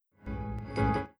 Windows NT Startup & Shutdown; Windows Never Released Soundpack 2

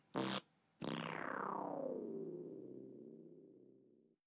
Windows NT 6.0 Workstation Shutdown; Windows NT 6.0 Workstation with MIE Shutdown; Windows Never Released Soundpack 1

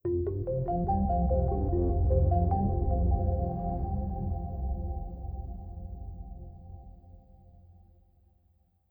Windows Startup; Windows Emerald Startup; Windows Never Released Soundpack 2

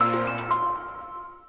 Samsung Galaxy S5 & Europa Shutdown

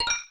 Windows CE 10.0 Startup; Windows Never Released Soundpack 1

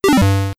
Windows Command Shutdown; Windows Command 1.0 Shutdown; Windows Never Released Soundpack 2

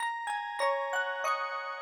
Vine Linux Shutdown

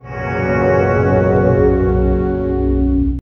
Windows 4.0 Beta Startup; Nermal Cat; Windows Never Released Soundpack 1

